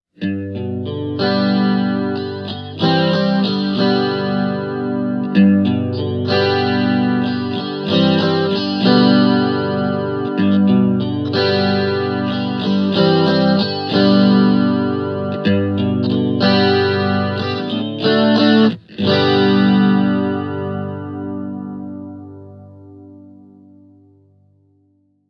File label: clean chorus fender stratocaster; roland cube lite